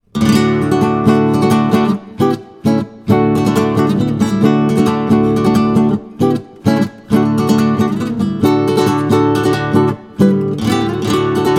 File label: Solo Guitar; Flamenco